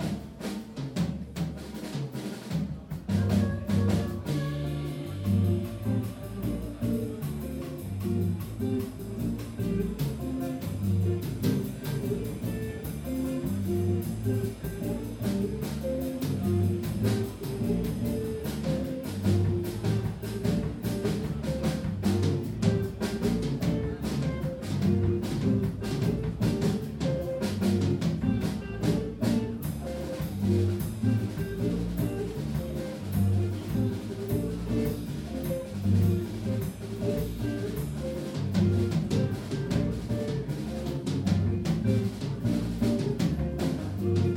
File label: Solo, drum